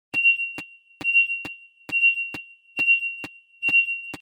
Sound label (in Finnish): liikkeentunnistin lyhyt